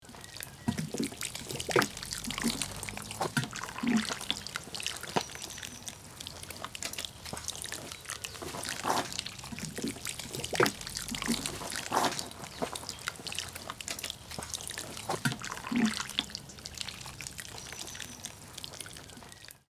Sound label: Garden watering plants or flower bed watering can